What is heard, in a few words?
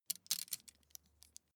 meter fold in single